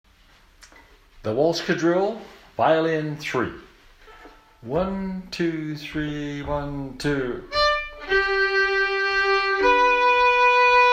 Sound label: the waltz quadrille violin three